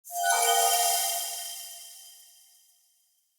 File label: Light Mirror Beam Hit Positive C; Puzzles